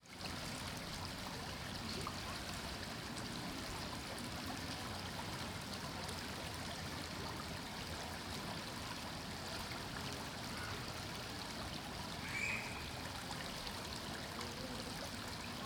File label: small stream on the street; ambience; NS Studios - ultra sound collection